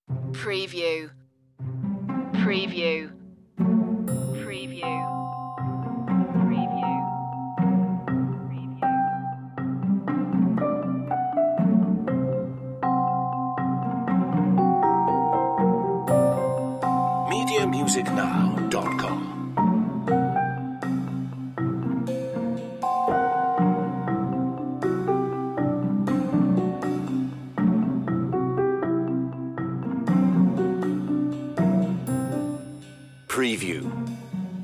no xylophone

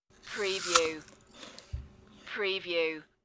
Apple Bite

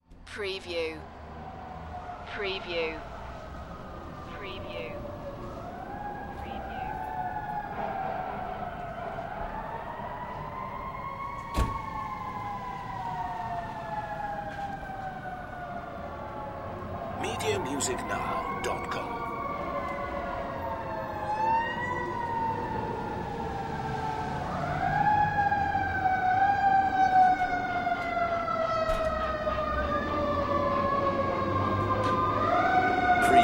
City Sirens

